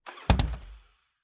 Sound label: harley start stall, harley-davidson